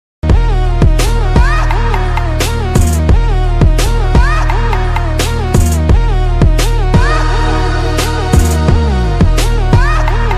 instrumental ringtone punjabi song

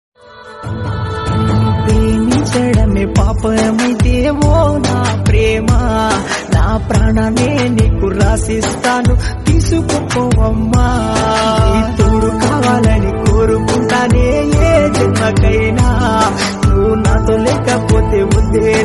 Heart Touching Telugu

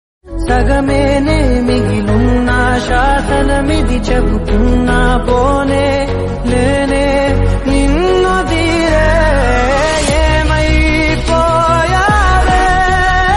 ROMANTIC Song

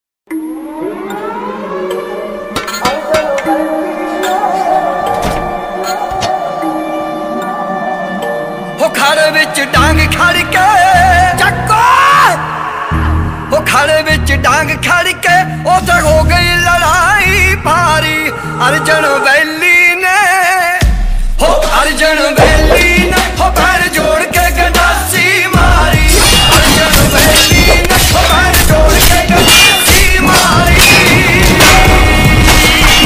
animal teaser bgm animal bgm ringtone

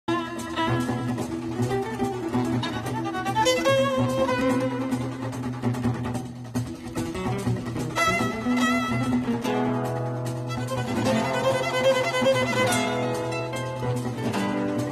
movie ringtone telugu bgm background music

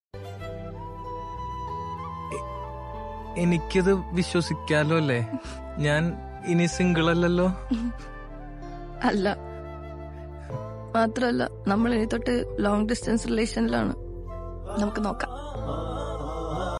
malayalam sad love emotional bgm

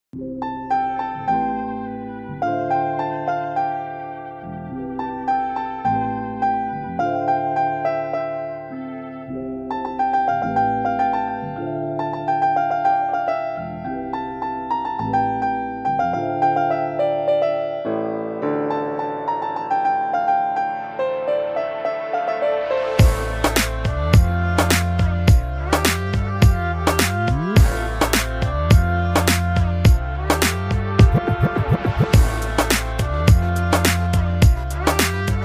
piano cover instrumental karaoke ringtone remix

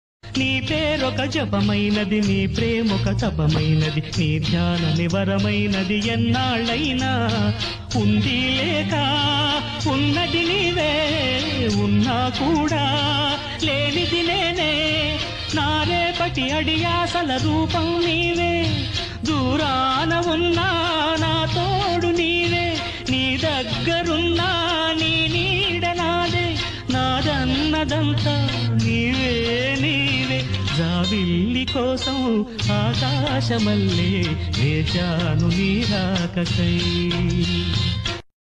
love bgm ringtone

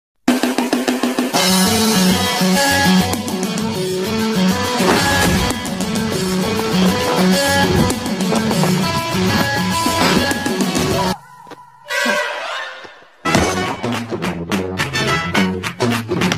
comedy bgm; saakini daakini

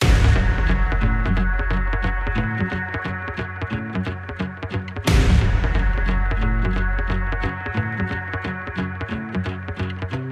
BGM

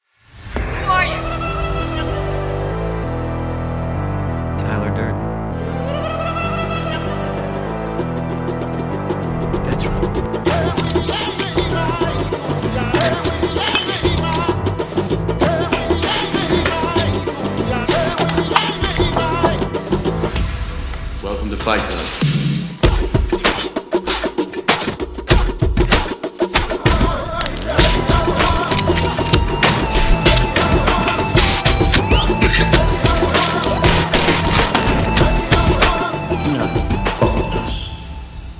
tamil bgm; fight club; doctor

